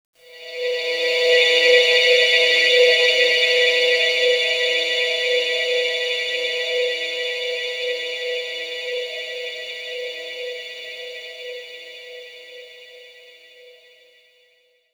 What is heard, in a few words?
Crazy Diamond Pad, Underground UK House & Electro, Pads; Sonic Foundry (Sony Creative Software)